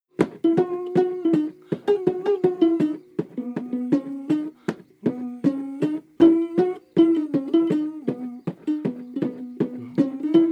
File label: urban tribal; Ragnar Johnsonが1971年にエチオピアでフィールド録音した音源集